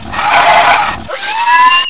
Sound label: sentry; duke